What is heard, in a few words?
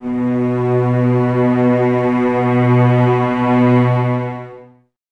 Cellos Expressivo, String Ensembles; Miroslav Vitous